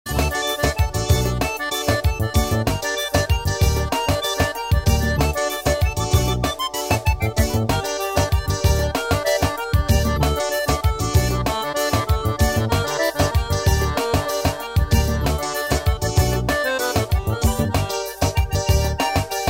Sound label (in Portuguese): teclados